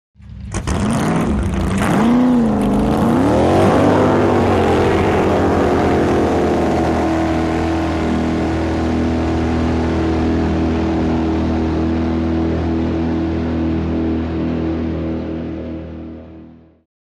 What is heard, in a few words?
Drag Boat Start revs